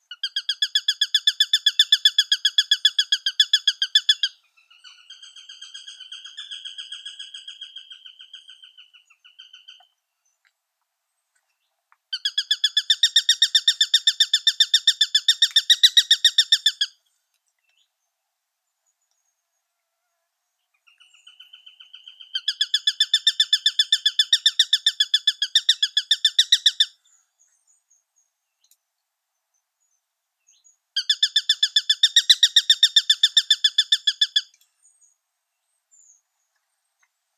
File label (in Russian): perepelyatnik accipiter nisus